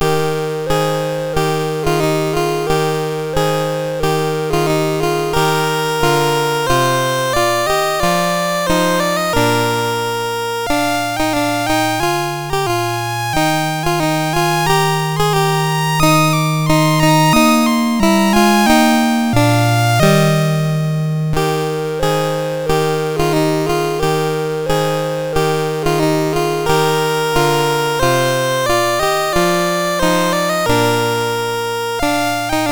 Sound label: Christmas Music, MOS6581R4